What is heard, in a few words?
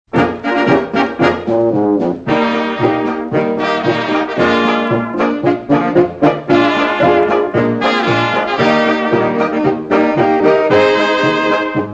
E FLAT A FLAT POLKA